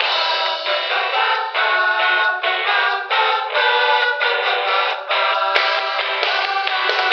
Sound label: voice always singing; Loops; Phonky